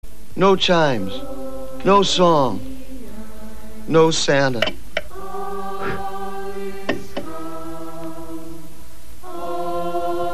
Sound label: Christmas Chimes are Calling; xmas; yaytime4xmas vol2